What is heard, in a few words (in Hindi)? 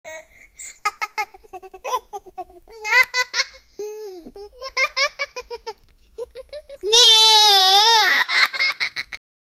15 saal bacche ki hasne